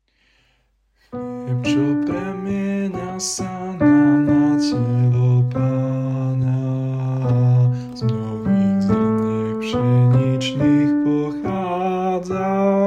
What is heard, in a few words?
bass